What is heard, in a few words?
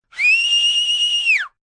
Whistle Shrill, Whistle, cheering, attention grabber, short; human voice; Whistling Sound Effects